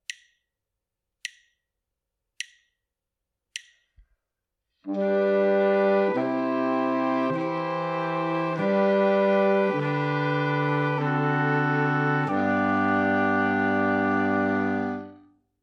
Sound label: harmonic dictation